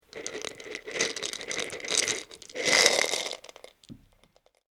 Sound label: Emptying A Bottle Of Tablets, pouring